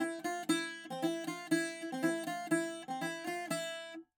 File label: bouzouki